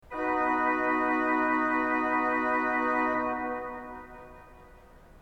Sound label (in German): ton, orgel